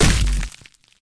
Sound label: punch heavy